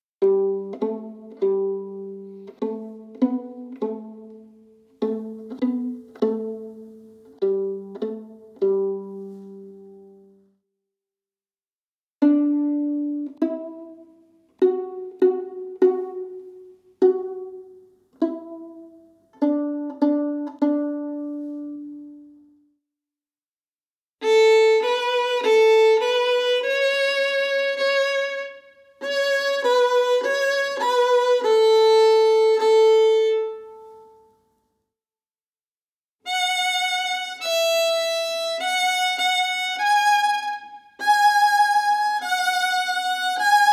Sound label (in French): Improvisation Q et R Violon